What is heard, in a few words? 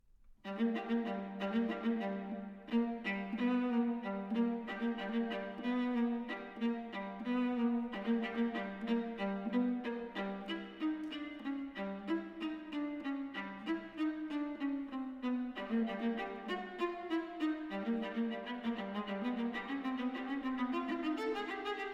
Trio II Vivace; PENDERECKI